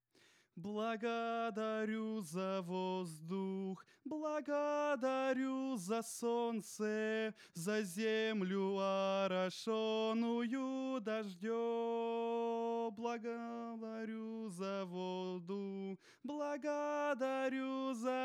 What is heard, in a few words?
alto dyn